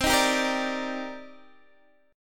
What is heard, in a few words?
C Minor 9th C Guitar DADGAD